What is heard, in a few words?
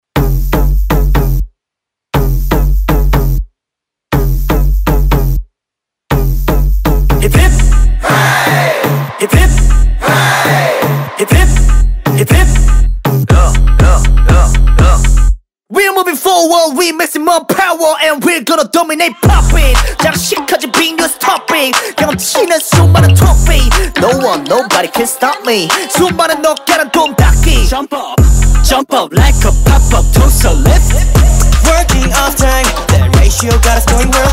K Pop